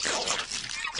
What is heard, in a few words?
infector idle; flood